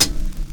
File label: HH +Dirt, Hi Hats; R&B Drums